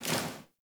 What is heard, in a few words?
Generic Weapon Aim Transition Out; Warframe